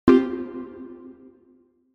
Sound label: Notification